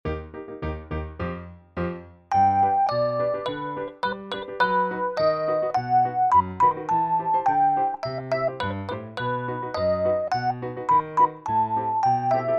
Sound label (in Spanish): REFE PIANO; convocatoria a audiciones el mago de oz